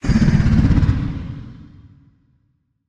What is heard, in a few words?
attack; Barotrauma; Legacyendworm